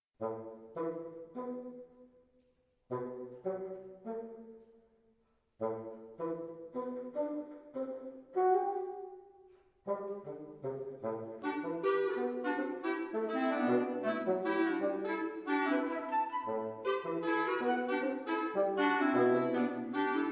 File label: Wind Quintet; Americana Wind Quintet; I. / Serenade; CRYSTAL RECORDS